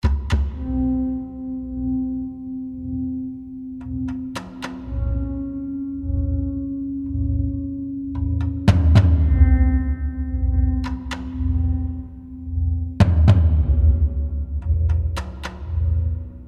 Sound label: Combat